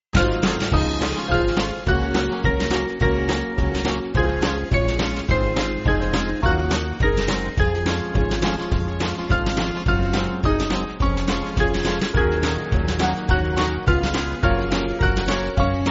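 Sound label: Band, Free Music Backing Files for Churches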